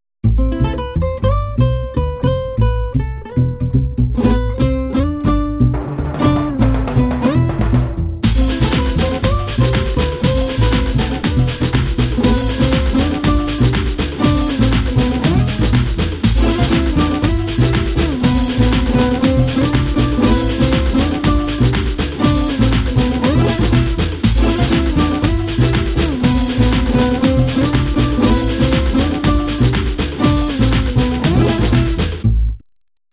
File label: rumba